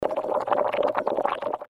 bubble

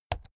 keyboard laptop key press slow hard

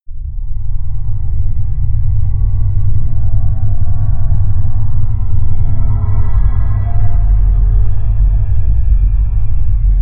drone panic

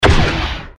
future gun shot

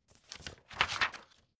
folder page turning high

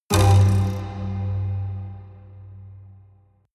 haunted strings buzz

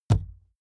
wood impact hollow large

